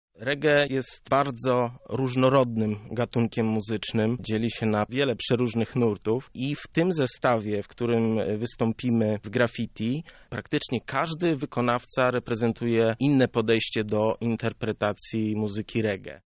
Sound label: Reggae; Reggae Connecting People; koncert muzyki jamajskiej